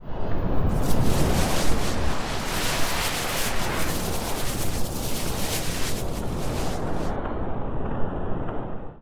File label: Noisy Swirls